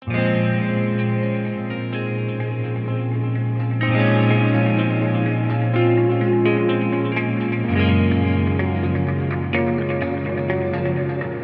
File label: mellow metal